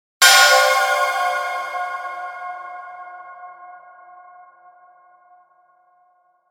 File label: Chime Impact Long Reverb; Production Element Imaging Element Accent Transition; 5.1 STEREO MIX; Blastwave